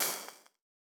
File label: Liquids water lava